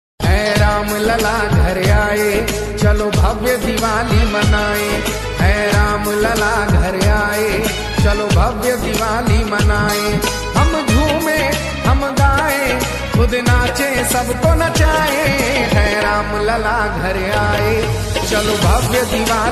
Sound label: Diwali ringtone; dipawali ringtone; Ayodhya mandir